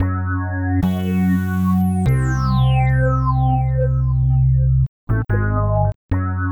Kreep; Sonic Foundry (Sony Creative Software); Synchro Funk

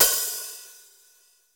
HI EDGE DRUM

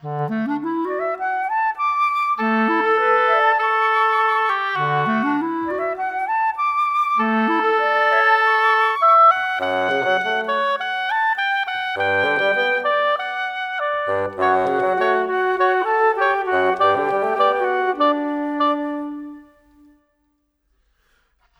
Winds, Orchestral, Rock Pop

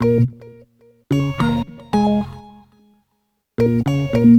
Tones; Sonic Foundry; Sony Creative Software; Stylus Pressure Urban Grooves on Digital Wax; Nu Tang

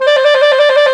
WND TRILL, Clarinet FX

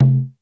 NATIVE DRUM, D110 DRUMS; Crypton - DrumBase5000

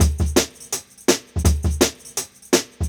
166, Jungle Breaks; 90s Sample CDs; Original Jungle Breaks

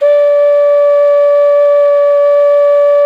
quena C#5; SoundBytes - Early Patches

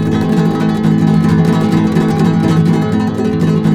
A# M, Guitars; Spectrasonics; Hans Zimmer Guitars VOL-2; FLAM TREM CH

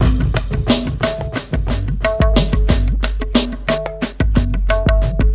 Ambi Groove

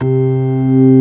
ORG. C3; B3 FULL

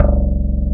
BS BS. GTR, Soul E. Bass; Roland - Rhythm Section

